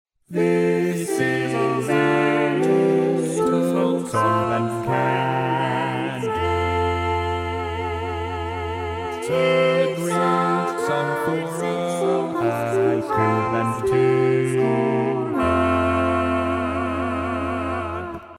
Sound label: Bach Chorale first practice